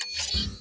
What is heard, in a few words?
force field on; sona